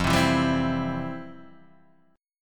F Major 7th Suspended 2nd, F, down, guitar; strummed; JGuitar

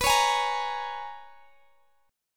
Bb Minor 7th B; Bbm7/B strummed

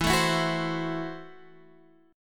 Gb Minor 7th Flat 5th, Guitar

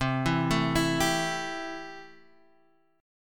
C 6th C down, chord; arpeggiated

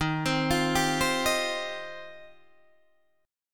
Eb 6th Add 9th, Guitar, Open D

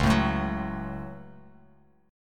Db Suspended 4th Sharp 5th, Bass Standard; 9,12,7,11; strummed

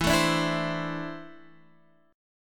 E 7th Suspended 4th Sharp 5th, Guitar, Open D

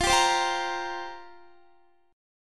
F Suspended 2nd Suspended 4th F down Guitar; Fsus2sus4; strummed

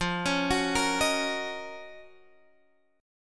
F sharp Major 13th F

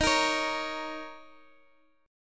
Bb Minor Double Flat 5th Eb

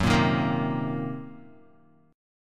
Gb 6th Flat 5th Gb down Guitar

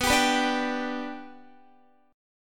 B Minor Sharp 5th, Guitar; strummed

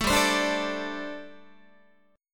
G Suspended 2nd Suspended 4th, Guitar

